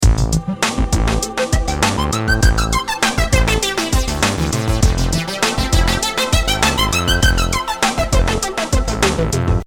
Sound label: automation korg gadget